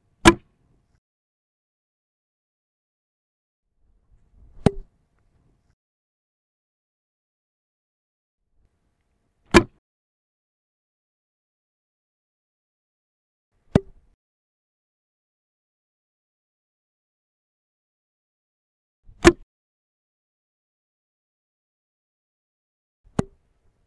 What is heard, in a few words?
Launching Air Rocket